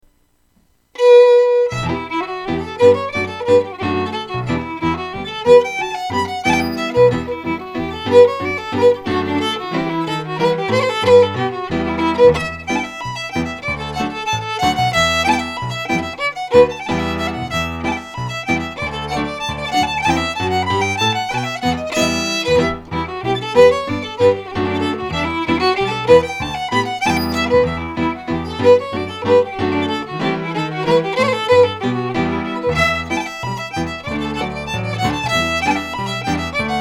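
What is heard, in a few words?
Reel, Scottish Fiddling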